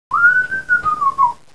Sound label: whistle lofi